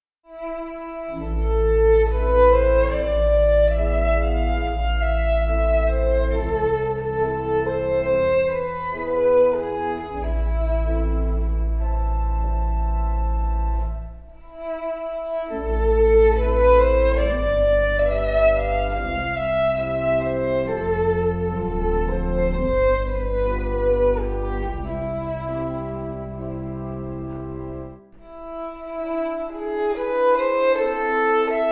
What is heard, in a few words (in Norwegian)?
solveigs sang fiolin